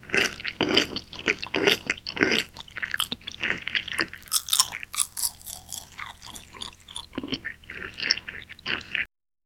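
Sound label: eating watermelon, fruit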